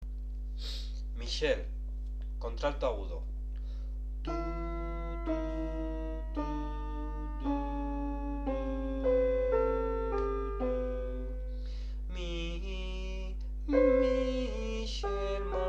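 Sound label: alto 1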